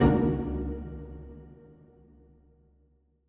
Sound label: Strings Hit Pizzicato